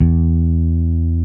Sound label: Basses, Roland